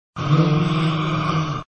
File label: gasp